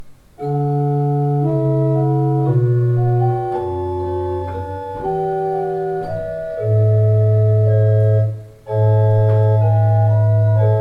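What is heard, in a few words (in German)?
Schumacher, pfeifenorgeln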